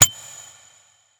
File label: reel bounce; netent